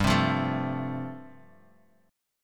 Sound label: G 9th Suspended 4th, Guitar, Drop D; 5,3,3,2,x,x; down